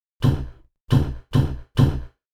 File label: Angry Knocking